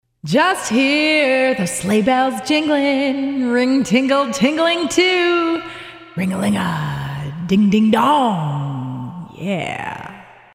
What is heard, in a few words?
Sings